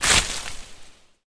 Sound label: bush break